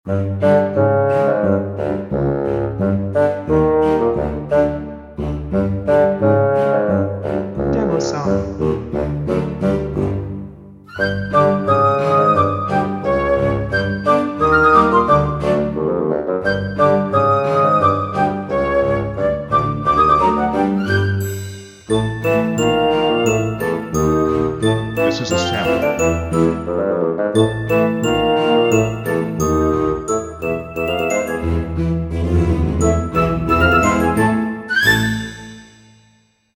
Flute

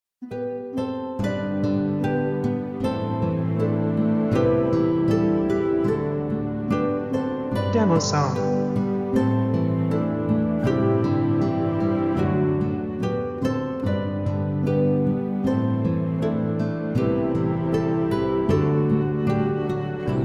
Little Village Bright Side Lyrical Flutes

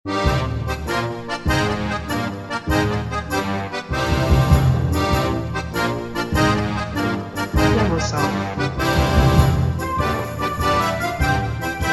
Drunken Sailors Tavern

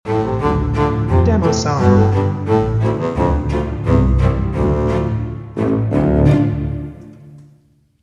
Quirky Little Tale Bassoon Ending

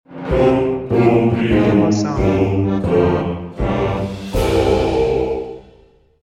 Pirate Choir

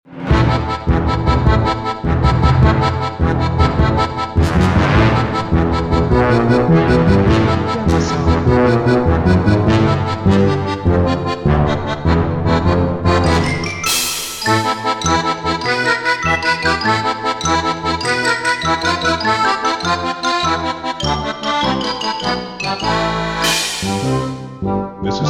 Funny and Tricky Adventure

